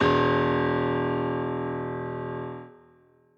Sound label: bass piano